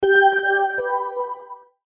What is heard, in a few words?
Logo Musical Sweeper; Sonic Logo; Blastwave